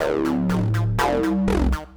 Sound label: bgm acid; ゆめ2っき